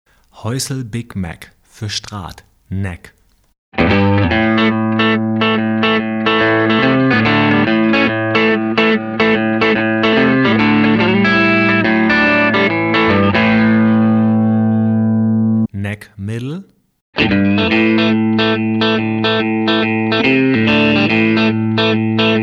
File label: Häussel Big Mag Set for Strat; Noiseless Pickups für Strat